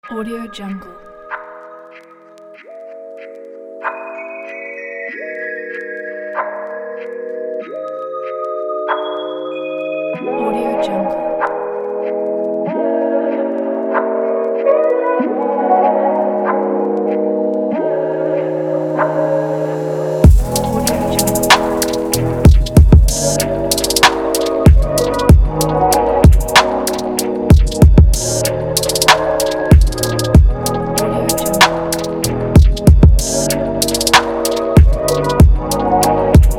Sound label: sexy erotic chill trap beat